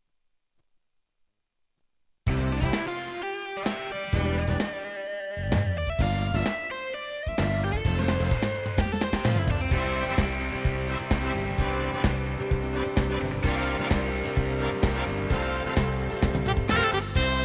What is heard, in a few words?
Live Soul Band; tyros2